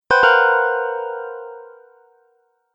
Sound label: Failure